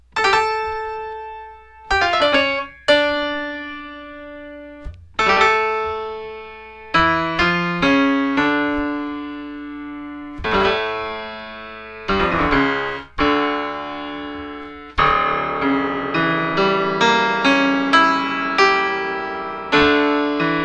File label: Toccata And Fugue In D Minor Opening Piano; Bach; HiFi